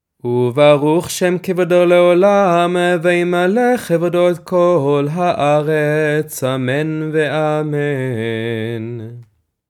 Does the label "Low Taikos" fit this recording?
No